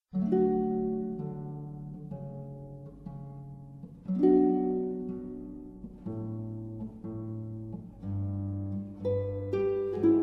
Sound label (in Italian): Aria sulla IV corda arpa; JS Bach; matrimoni